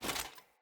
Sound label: Foley Action Armour Run; Mother Armour